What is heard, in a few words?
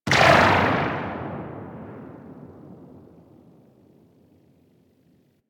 Anti Aircraft Gun Tail C; Weapons; Warframe; Archwing